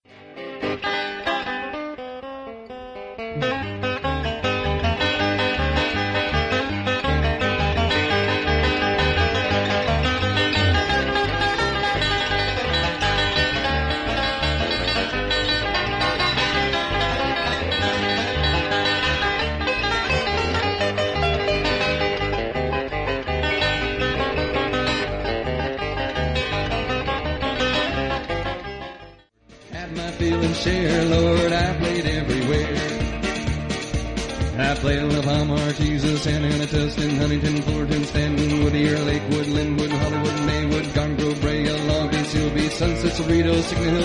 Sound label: banjo